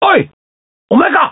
attack; votemap; overflow

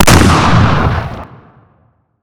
barrel

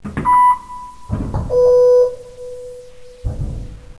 elev bell

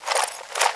key pickup

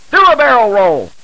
barrel

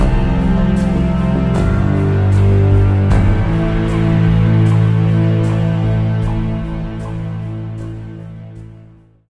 victory

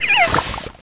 scorpion die

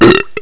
player dirt

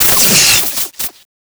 zap

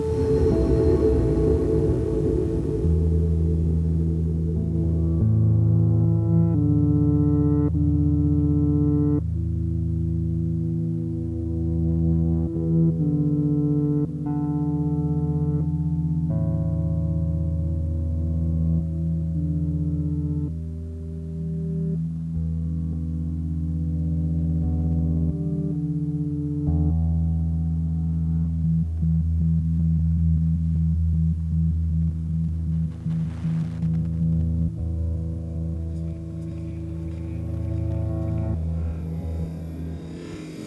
loop; zebrahut; monad

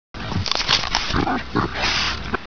ourano bite

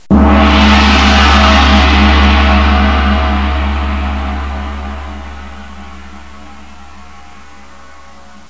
gong

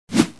punch swing

